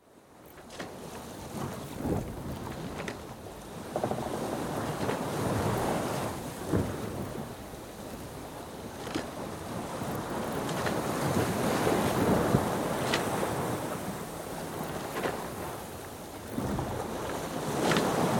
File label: Yacht, sailing down-wind, with distant lightship (30ft sailing yacht, Halmatic 30, GRP, sloop rig); 'Alice May'; Boat; BBC